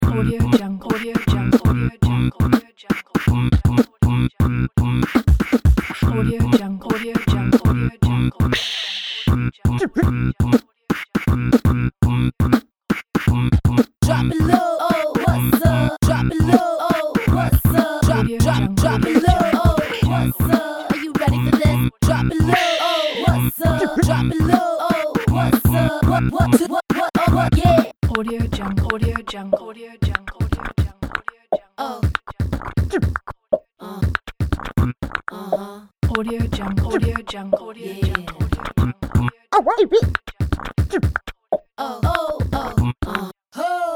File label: beatboxer